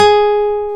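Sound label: classical guitar, nylon string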